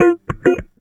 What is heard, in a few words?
Guitars, Drum & Bass, D; Total Drum&Bass; 90 s Sample CDs